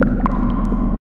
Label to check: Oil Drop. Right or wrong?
Right